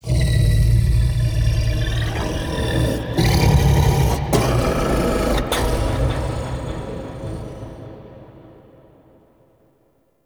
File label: Whispers Large; MITW; Warframe; Ambience; Entrati